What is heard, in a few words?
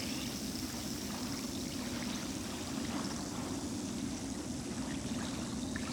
soundscape water; gris